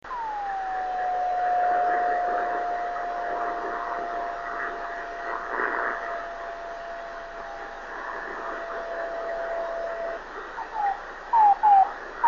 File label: 26620KHz